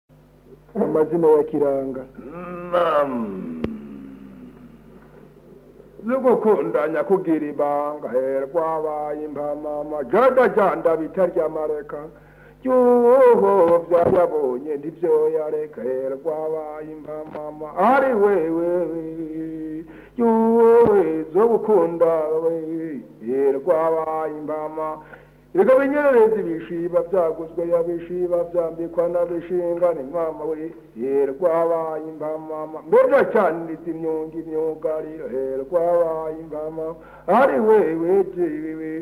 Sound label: kiranga, burundi